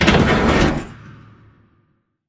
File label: pod door ext verb open slide; Titanfall 2